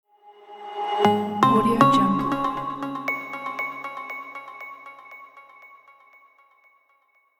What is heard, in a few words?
short corporate logo